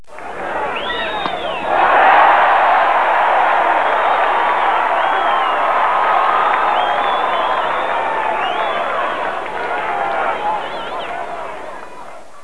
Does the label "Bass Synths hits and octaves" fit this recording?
No